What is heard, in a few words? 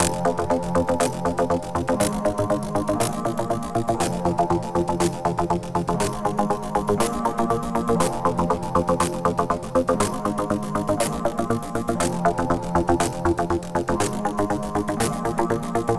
Hard Ride Loop